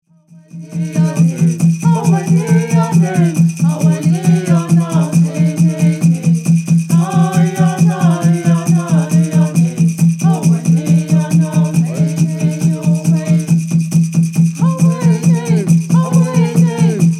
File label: Straight Song